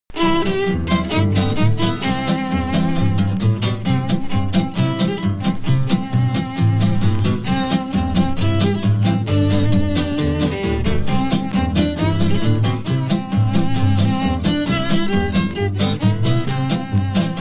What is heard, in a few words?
instrumental